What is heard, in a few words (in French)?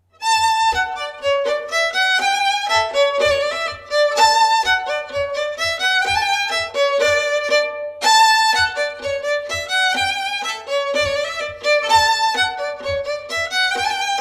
Bourree; Recueil Hainl; Bourree de Pontaumur